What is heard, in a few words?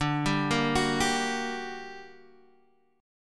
G Minor Major 7th D